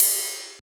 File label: Ride; FLPRO